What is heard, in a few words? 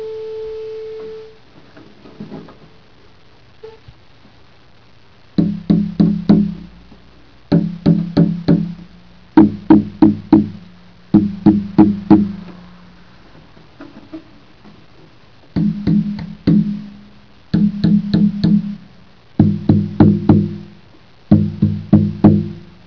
hofner